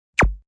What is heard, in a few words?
Lazer Sound; Laser Sound